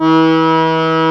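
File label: accordion A3; East West - Global Sounds; FOLKLORIK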